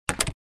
Metal door closing soft impact

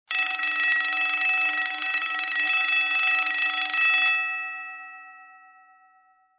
Emergency bell fast ringing

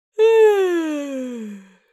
Adult female exaggerated yawn

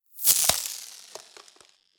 Ice whoosh transition

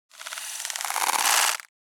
Insectoid creature alien hurt

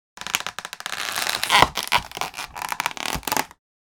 Hard plastic crunch; gfxsounds

